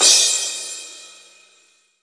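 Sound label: CRASH, DRUMS; Best Service; Alpine Volksmusik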